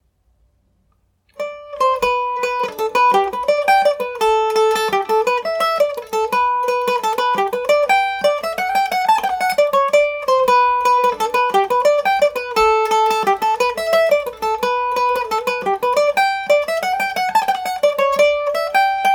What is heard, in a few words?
fasten the legging gmaj, mandolin